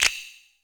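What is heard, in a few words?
GATE percussion snap; Crypton - DrumBase5000; GATE KITS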